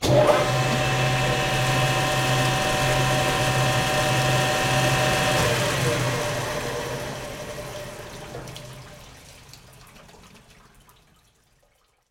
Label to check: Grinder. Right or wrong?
Right